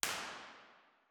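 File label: impulse response, nuclear reactor hall; b-format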